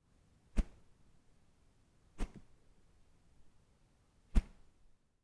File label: Whip Light